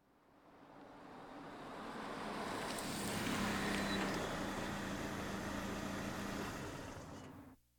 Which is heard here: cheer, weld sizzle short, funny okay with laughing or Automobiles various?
Automobiles various